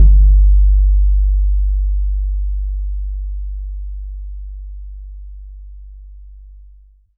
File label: BAYAN, Drums Percussion